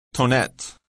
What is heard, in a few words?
tonette